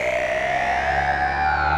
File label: SPACE FX 140; SpaceSounds